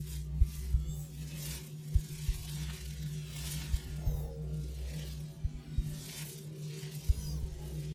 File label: ship engines; World Of Warships